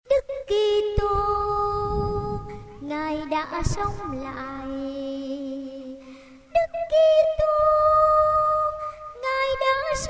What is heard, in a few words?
soprano